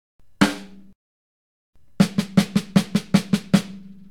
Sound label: Snare drum muffled; Ударная установка